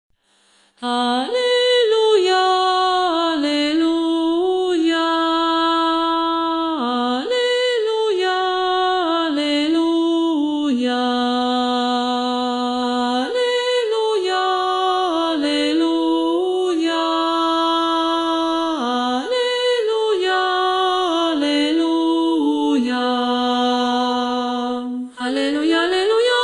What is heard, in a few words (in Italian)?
alleluia soprani; DONNE